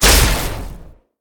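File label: weapon fire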